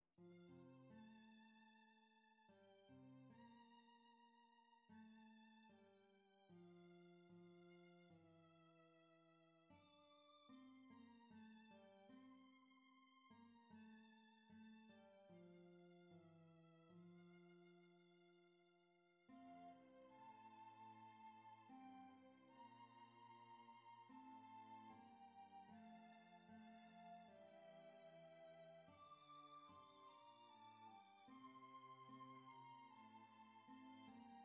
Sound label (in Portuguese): Contrabaixo Dona Nobis Pacem